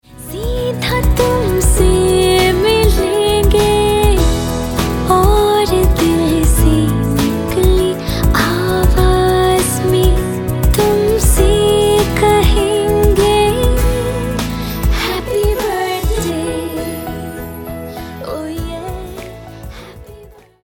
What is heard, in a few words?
HAPPY BIRTHDAY TUNE SPOUSE HINDI FEMALE